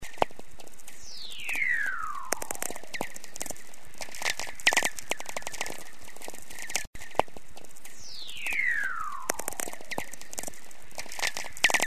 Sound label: Death Valley whistler